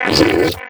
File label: HURT